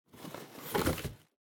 Cardboard Box Open; ダンボール; 開ける; 梱包材

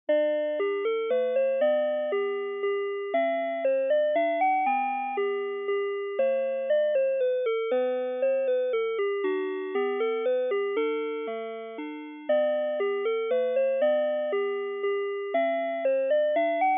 Telephone Music On Hold Minuet

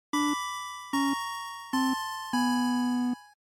NES Shooter Game Over

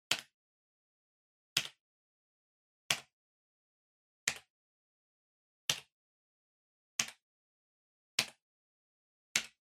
PC-Keyboard (Far-Single-Hard)

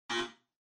Electromagnetic Buzzer Room

